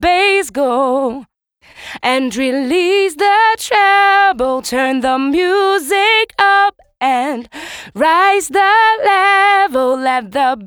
Bass Go Dry

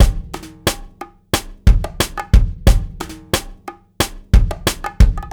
Onbeat

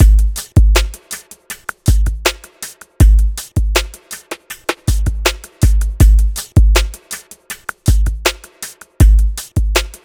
Polarbeat D, ambient

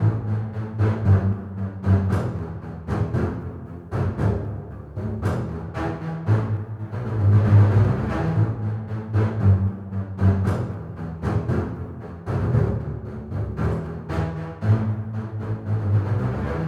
bass spiccato, средневековая мелодия; средневековая мелодия для саундтрек

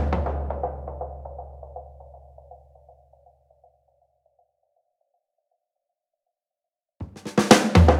Drum Break, electro dub

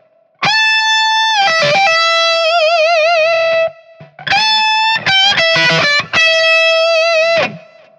Solo Guitar B; соло

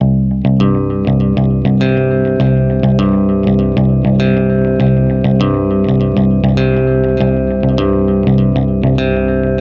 Baritone delay; Rock & Pop

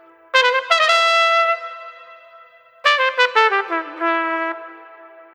Trumpet Solo; труба

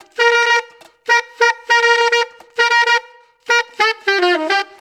Brass; духовые

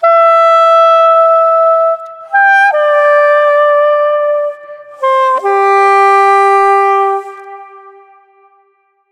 Sop Sax FX

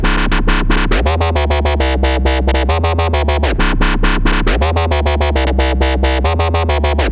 Panic A, dubstep

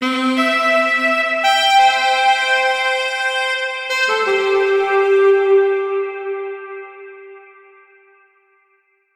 Saxophonia

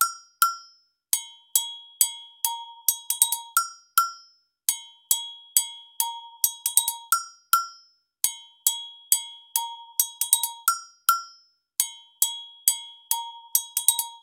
Agogo 135 BPM; перкуссии percussion loops

